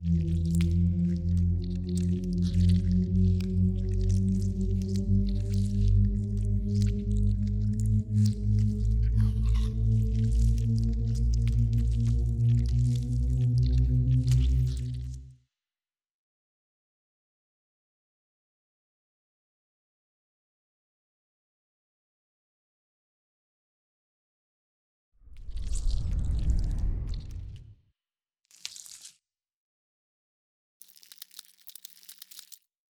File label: ant ship colossus working opening; biogenesis